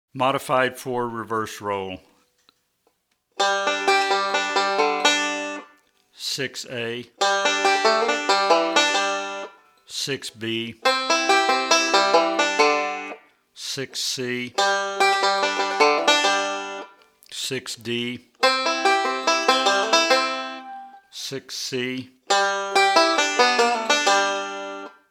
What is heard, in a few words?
Modified Forward Backward Roll